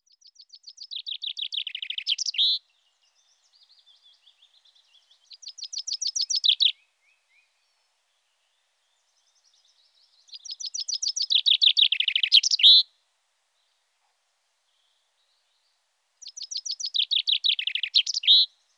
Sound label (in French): Pinson des arbres